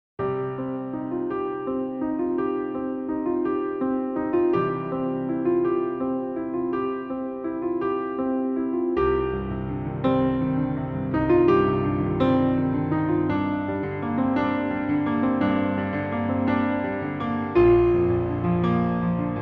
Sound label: Piano; Game Of Thrones